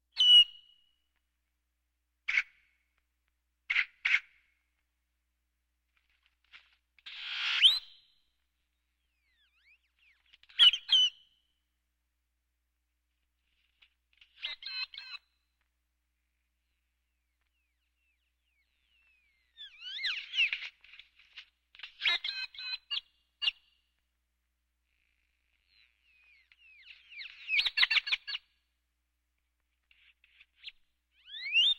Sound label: Birds